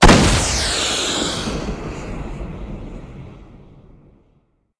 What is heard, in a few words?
gun tow; guns; World of Tanks Blitz